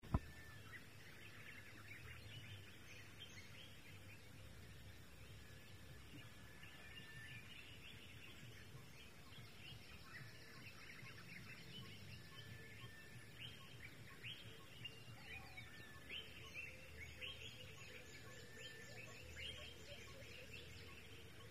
Forest Waking Up at 5am Kuma Apoti Togo

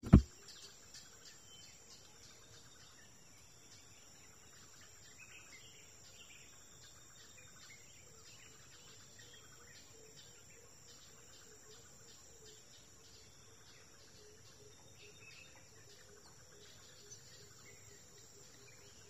Forest at 6am Kuma Apoti Togo

